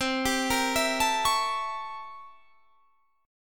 C 13th Flat 9th C

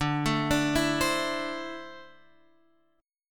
D 7th Suspended 2nd; x,5,7,7,5,8; arpeggiated; JGuitar

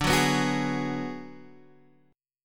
G sharp Minor 7th Flat 5th D

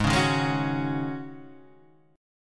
G sharp 7th Suspended 4th, G#7sus4 chord; strummed; 4,4,1,1,2,2; JGuitar

